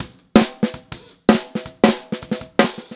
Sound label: Snare, 162 BPM; Drums; Drums from the Big Room The Mixes; Take Your Toms Off; Verse